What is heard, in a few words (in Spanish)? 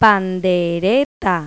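pandereta; Pipo-Aprende musica con Pipo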